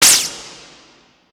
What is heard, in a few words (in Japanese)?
RP ピチュン